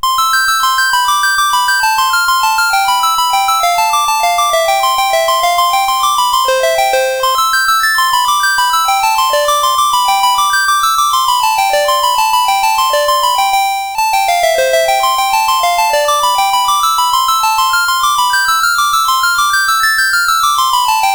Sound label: Sonata for Musical Clock, CSG8580R5